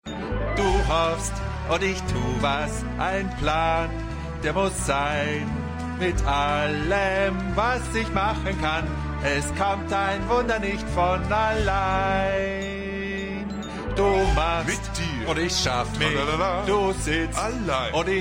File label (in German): Gesang; Serienfuehrer Weihnachtsfolgen Weltweit; Stimmproben